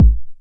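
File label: 909 BD tape, kick drum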